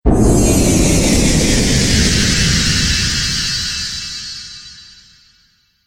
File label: Magic Explosion Delay